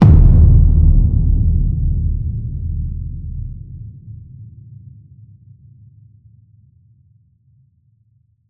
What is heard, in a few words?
HIT Low, Cinematic Hits Designed